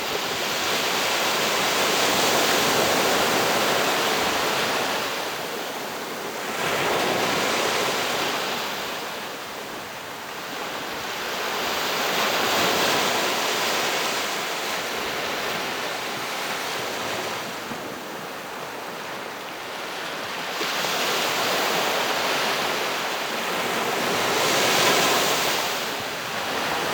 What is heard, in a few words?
Playa Kenepa Chicki; Original Video Ton; Curacao; Fieldrecordings